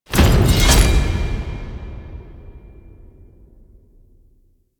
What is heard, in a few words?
Screen Open; Veilbreaker; Kahl